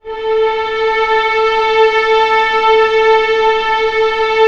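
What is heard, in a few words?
strings A3 PP L; Sound On Sound - Peter Siedleczek's Orchestra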